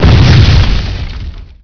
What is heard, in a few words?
explosion; ordos; Emperor - Battle for Dune